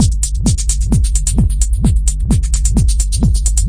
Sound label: 130 bpm whup whup and crispy fried hats